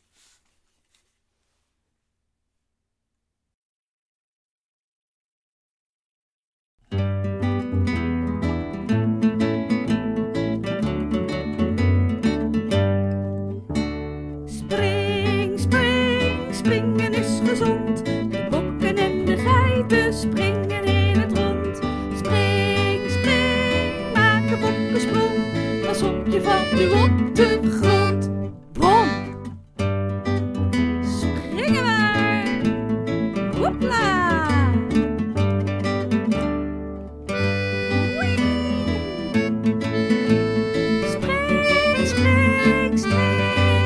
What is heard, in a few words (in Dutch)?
met zang